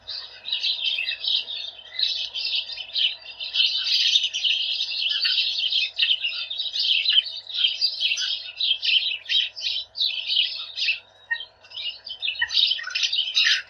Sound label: House Sparrow